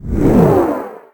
Pickup Magic Speed; Pickups

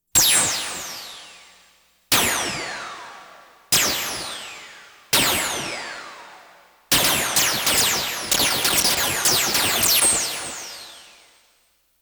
Science Fiction effects, Lasers, Light beams

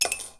Coin Toss Into Tip Glass; SFX Kit; Foley - Rattles

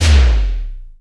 Menu Select

